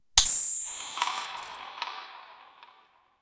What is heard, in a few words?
a sound of a beer, beer can